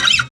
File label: Guitar Fret Noise; Roland BK-5